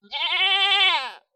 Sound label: goat, death; fauna